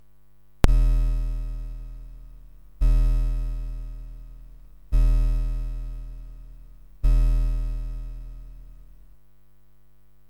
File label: SIDKick Pico with DAC 6581; Very similar to the real 6581 SID, but without the oscillators leaking when the volume is set to 15.; SID replacement; firmware 0.21